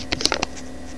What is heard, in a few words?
Paper; Origami